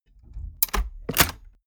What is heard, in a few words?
mechanics small movement